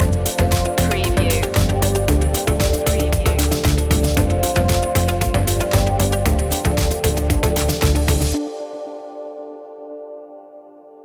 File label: bubbles loop end; media music now